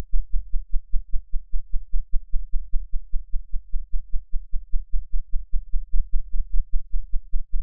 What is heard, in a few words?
beep 16ms; Da Sorg1 a Mip; LaSpezia; Hilbert; CESI